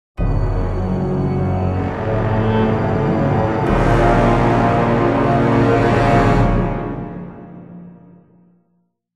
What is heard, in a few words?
Death Music; sonidos de muerte; Fallout 3